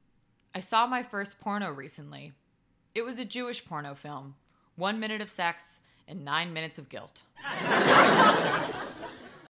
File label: Laugh Track; Joke